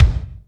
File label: BD, BD REAL